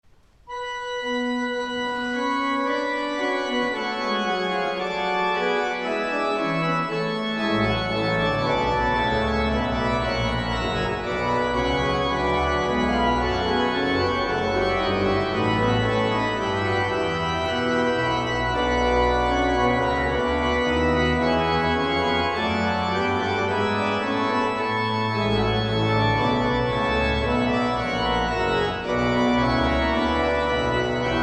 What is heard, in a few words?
Organ; Bach, J S; Chorale Prelude on 'Help me to praise God's goodness', BWV 613